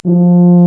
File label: tuba, brass; Solo Brass